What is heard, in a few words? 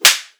Face Slap